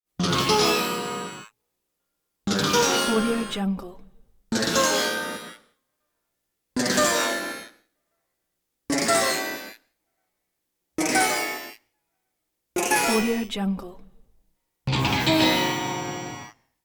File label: lute out of tune guitar metal string twangs; prosoundfx